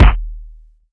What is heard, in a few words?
roomy kick drum single hit d sharp key